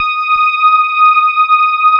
electric pianos; Wizoo Electric Pianos

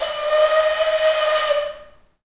AF. FLUTES; Heart of Africa; Spectrasonics; Heart of Africa 1

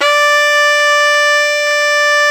SHENAI D4

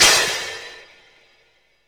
crash R; Zero-G; Chemical Beats; CRASH ZOO

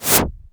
cinematic impact wipe

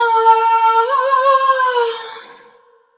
Single Voice Melodic Loops; Singles

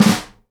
SNARE RUFF, Live Snares

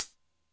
Cym Sputt, Drum Machines Alesis D4

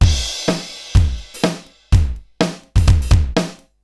Akai XE8 (125BPM), beats

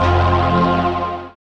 synth bass, dance synth; Sound & Vision - Gigapack I CD 2 (Roland)

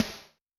Snare Gate; Yamaha DTXpress-II

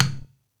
INDIAN PERC

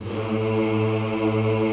A1 R, Classical Choir; Best Service; Extended Classical Choir

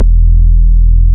BAS MINI, MOOG; Sound & Vision - Gigapack II CD 1

